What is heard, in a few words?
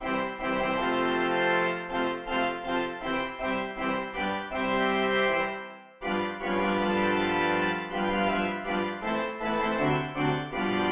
March Manualiter; L Lefebure Wely